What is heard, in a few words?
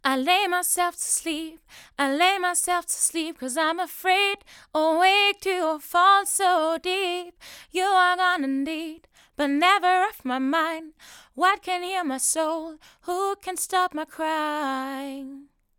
vocals, Neumann TLM102